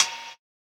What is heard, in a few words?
Closed Hat, JUNGLE BREAKS